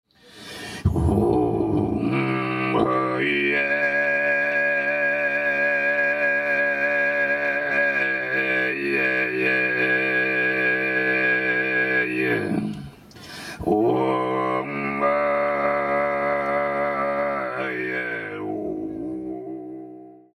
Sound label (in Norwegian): Strupesang